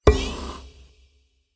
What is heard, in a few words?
mini monologue accept new